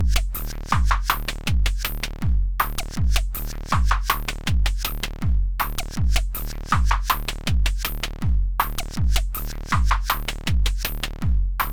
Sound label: Microtonic Tangent Groove